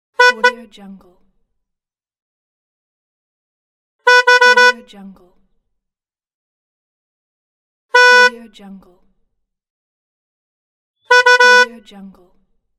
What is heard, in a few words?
car horn